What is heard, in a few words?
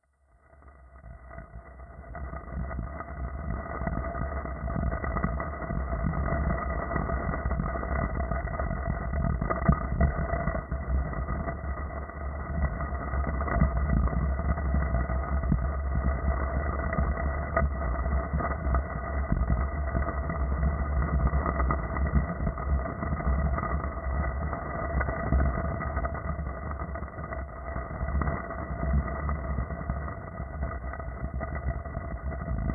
glacial groove; smallsounds